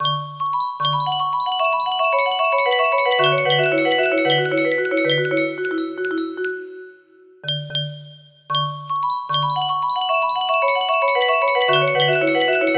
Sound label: square lead